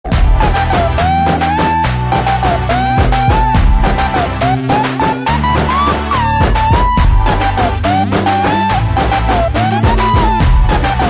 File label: Love Bgm